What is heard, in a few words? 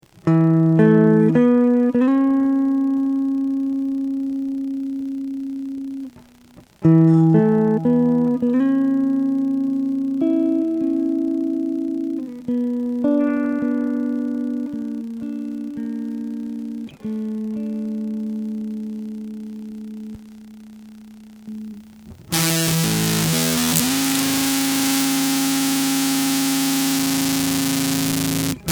self oscillate; paralleluniverse